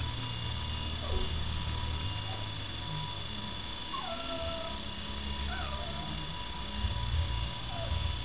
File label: whimper